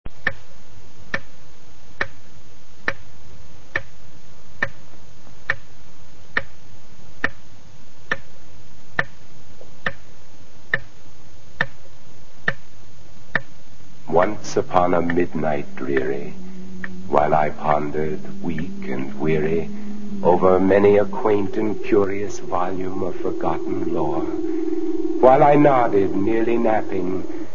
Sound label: raven